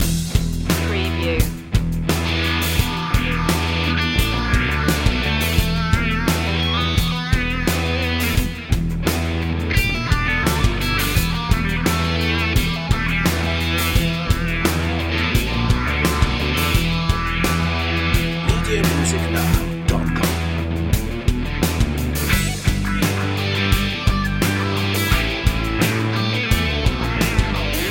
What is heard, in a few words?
1975 loop